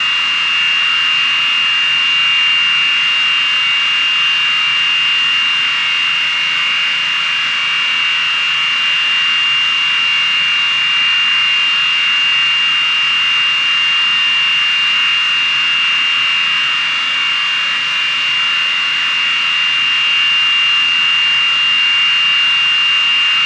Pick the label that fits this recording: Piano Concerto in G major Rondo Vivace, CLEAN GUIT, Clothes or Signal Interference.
Signal Interference